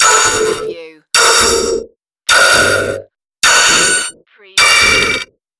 system interface glitches

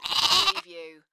Lamb bleating; beanstalk audio

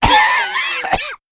Male Cough; beanstalk audio

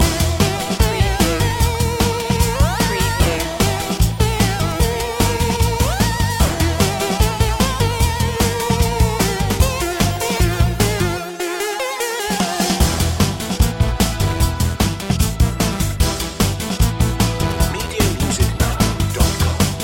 chip monkeys loop

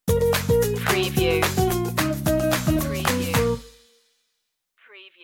thumbs up island stinger

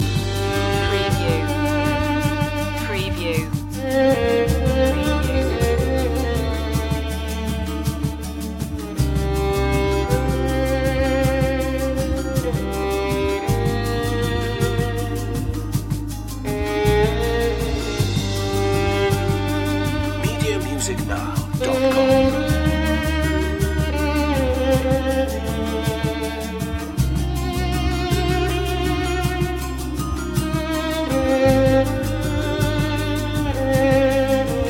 balkan

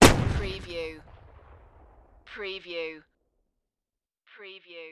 Grenade Distant

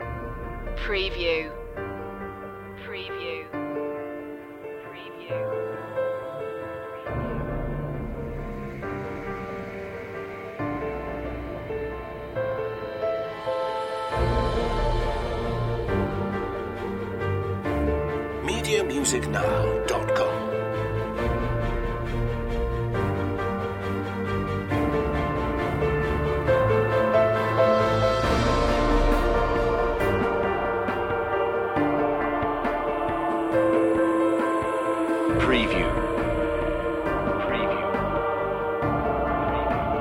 the fountain of life with choir relaxed

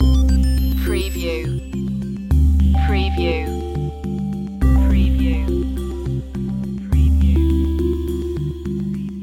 loop, chill

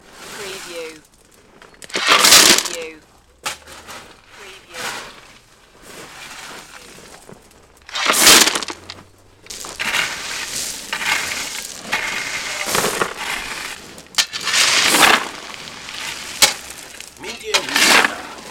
Shovel Gravel

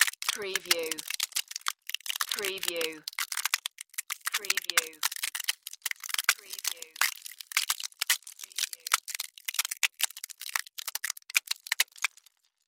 Ice Crack Long; Sound Effects Bible

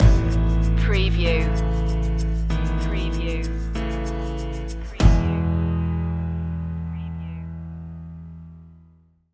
midnight shuffle stinger

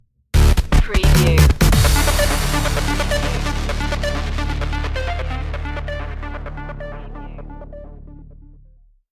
acid funk sandwich stinger

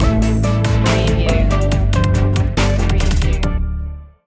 so hip stinger

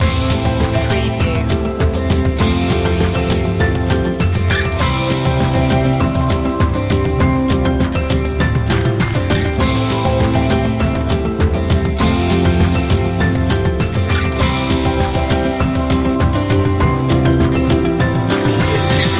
walk together loop

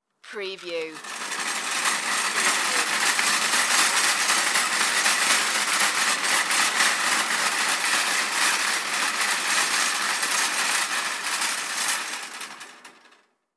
shopping cart

